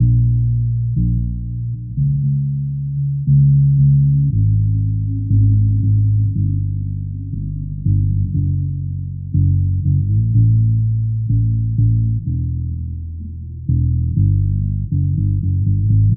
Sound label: bass, Loops, Sad